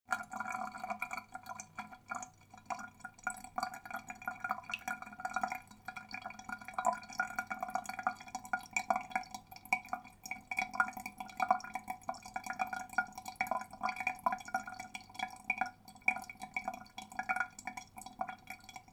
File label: water drain